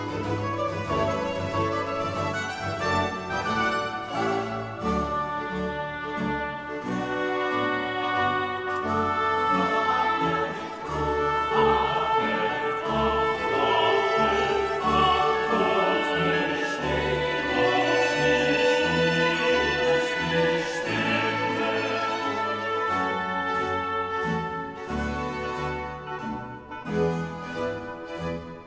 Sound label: Chorale; Wachet auf ruft uns die Stimme BWV 140; Bach Collegium Japan; Bach Collegium Japan Chorus; Classical